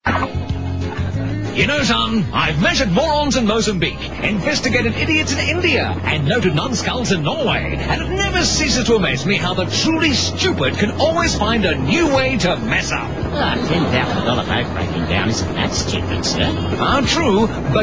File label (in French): Helico depanneuse